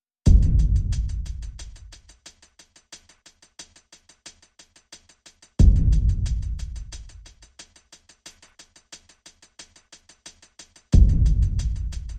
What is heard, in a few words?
Perc Only